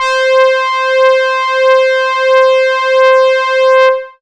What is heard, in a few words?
OBXA C5, Oberheim OB-Xa, Synths; Keys